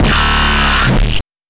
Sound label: HYPERPOP, bass